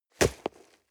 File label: nylon leather hit